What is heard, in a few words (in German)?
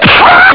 kick knie; Amiga Soundtracker sample